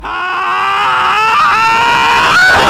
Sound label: soldier scream mad